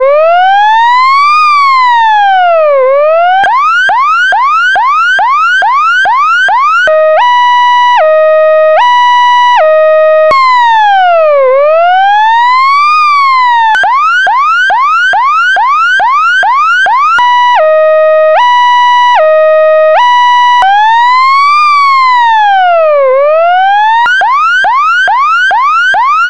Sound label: emergency electronic siren